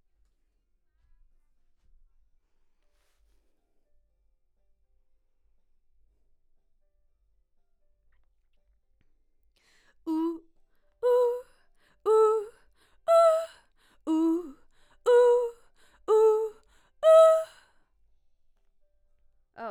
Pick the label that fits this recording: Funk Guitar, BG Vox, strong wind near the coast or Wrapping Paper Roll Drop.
BG Vox